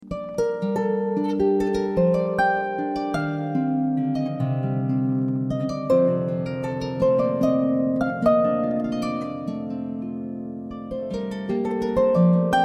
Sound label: Harpist; Toronto